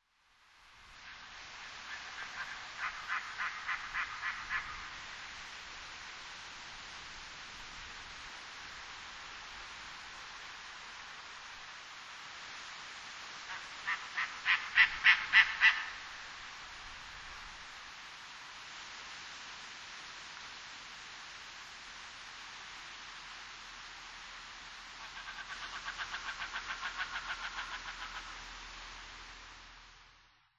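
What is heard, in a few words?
Steller's Sea Eagle, yakumo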